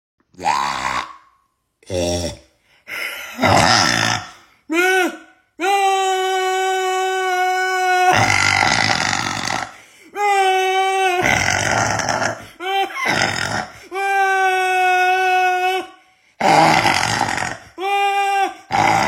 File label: screaming; voices